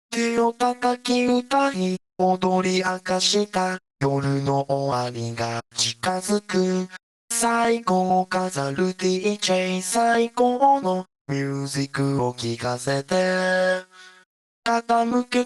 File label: VoxBox Off